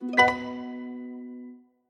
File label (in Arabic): إنتقالة ختامية